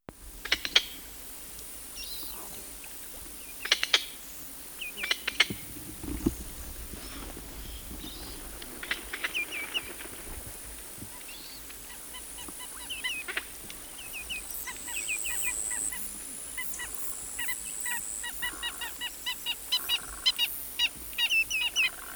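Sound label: Glossy Ibis; Plegadis falcinellus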